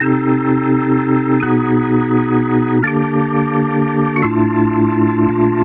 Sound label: Organ 85bpm C; Chordy Loops